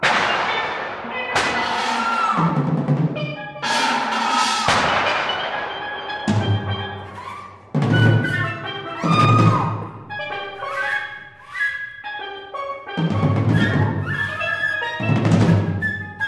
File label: A Flute in the Kingdom of Drums and Bells; chamber music